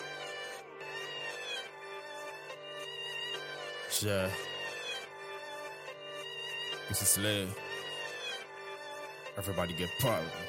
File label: Freestyle